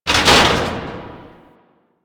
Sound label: Military Checkpoint Metal Hit; Warframe; Ambience; Nineteen Ninety Nine